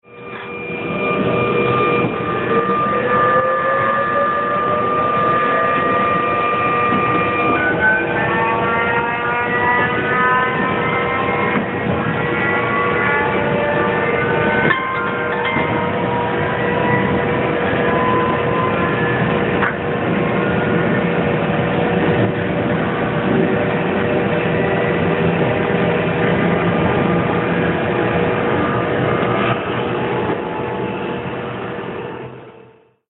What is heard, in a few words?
SNCF Train Pulse Motor Control